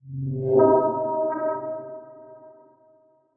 Title Transition Reverse Fly By Reverse; Production Element

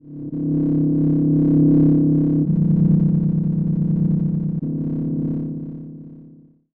Science Fiction Sci-Fi Electronic Hovering Drone

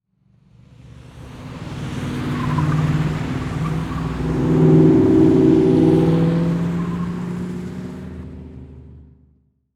Transportation Car Race Car Drive By Big Turn

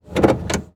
Truck Ford Ranger Shift Gears Interior; transportation

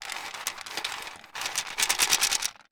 Foley Rocks On Metal Rattles

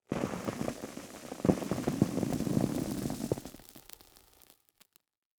Impact Dirt Fall; Impacts

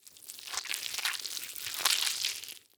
Horror Scary Guts Wet Human Guts Smash

